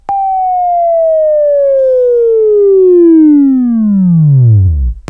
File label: Buzz